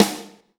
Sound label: XV Snare, Roland XV-5080